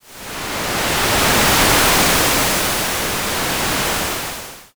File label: wave